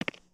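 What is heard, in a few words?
Foot Concrete Sneaker Walk; footsteps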